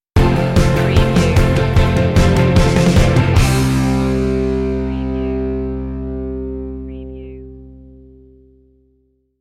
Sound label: active stinger